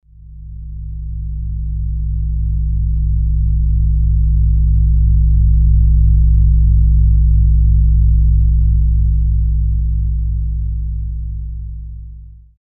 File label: Space Hum